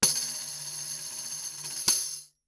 tambourine2 shakeroll ff stereo, Tambourines; MIS Pitches - 2014; Percussion

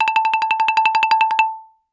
Xylophone hard rubber roll ff A5 stereo; MIS Pitches - 2014; Percussion

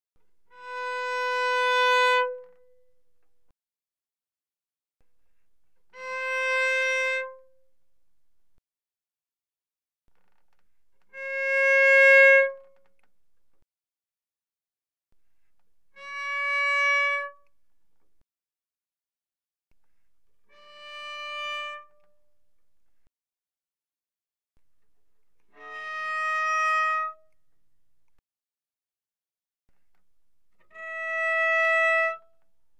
Cello arco pp sul A B4Ab5; Strings

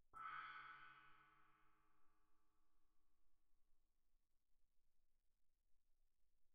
splash mallet pp, suspended cymbals; Percussion

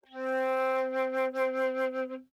Flute vib ff C4 stereo; Woodwinds

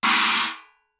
chinese choke crash, Suspended Cymbals; Percussion

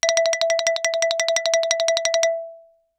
Xylophone rosewood roll ff E5 stereo; MIS Pitches - 2014; Percussion

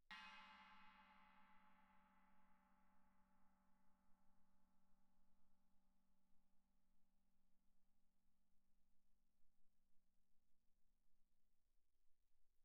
chinese stick shoulder pp, suspended cymbals; Percussion

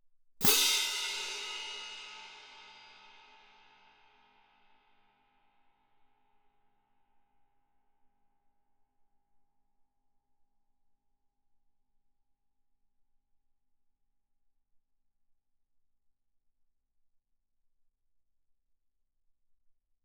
18 orch crash, french, ff, crash cymbals; Percussion

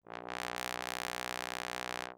Bass Trombone ff B1; MIS Pitches - 2014; Brass

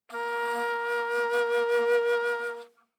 Bass Flute ff Bb4; Woodwinds

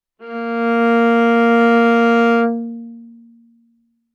Viola arco ff sul G Bb3; MIS Pitches - 2014; Strings

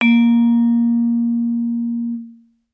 Vibraphone short sustain ff Bb3 stereo; MIS Pitches - 2014; Percussion

